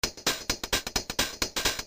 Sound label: loop